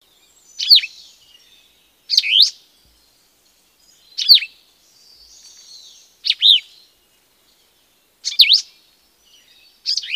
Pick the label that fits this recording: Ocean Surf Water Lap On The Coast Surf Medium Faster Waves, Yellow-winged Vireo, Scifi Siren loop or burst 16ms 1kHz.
Yellow-winged Vireo